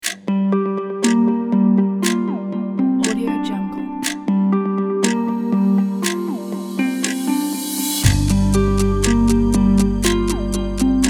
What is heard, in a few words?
the quiz show countdown ident